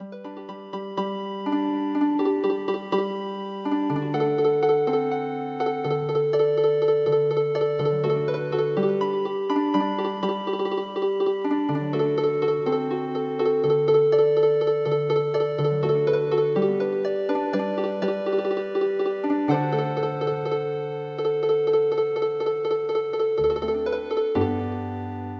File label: Healing Harp, traditional; The Circus Song